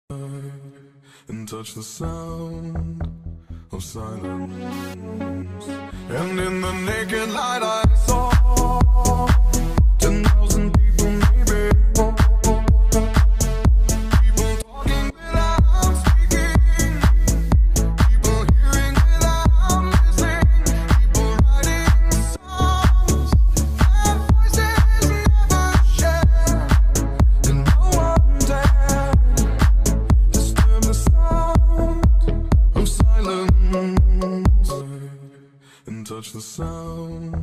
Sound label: bassboosted bounce remix